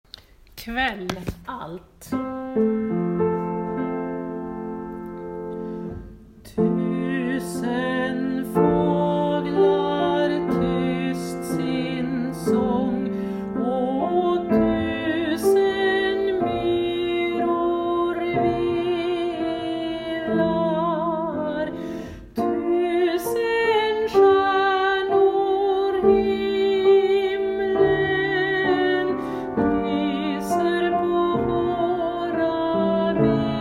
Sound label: Kvall Alt